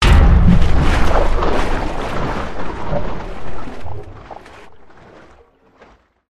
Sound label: Crane Impact Water Tower; The Unfinished Swan; 3D